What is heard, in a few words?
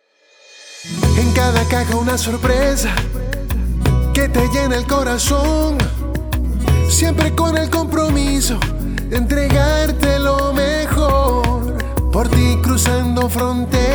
JINGLE